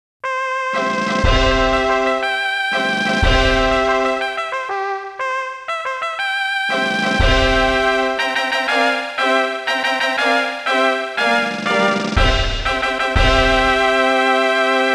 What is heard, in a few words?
Fanfare - Big Prize Race; koei original bgm collection vol.13; winning post; winning post 2; Kansai